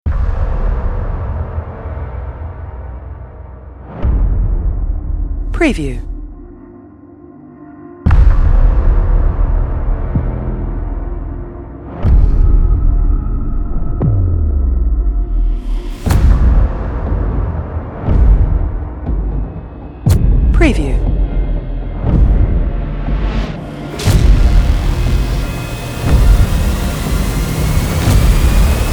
Cinematic Dark Horror Trailer Music Scary Crime Eerie